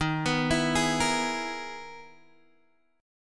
E-Major Sharp 11th Eb

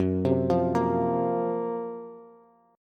Gb Major 7th Flat 5th Bass; GbM7b5 arpeggiated; JGuitar

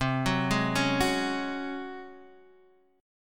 Db Major 7th Suspended 4th C

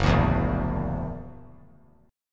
F sharp Augmented 7th F sharp, chord; F#+7 strummed

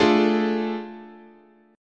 A Major 7th Suspended 4th Sharp 5th, guitar chord

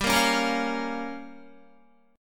G-Minor 7th Flat 5th, Guitar, Gm7b5 chord; strummed; JGuitar

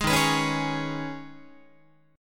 D sharp 7th Suspended 4th Sharp 5th, Guitar; D#7sus4#5 strummed; JGuitar

